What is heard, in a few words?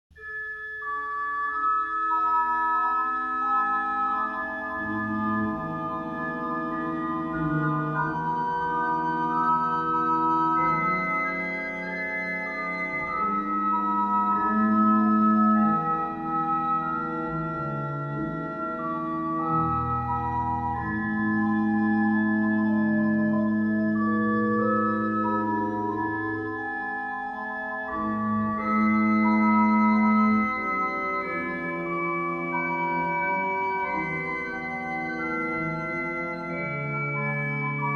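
Chorale Prelude, organ; Bach; Savior of The Nations Come BWV 599; orgelbuchlein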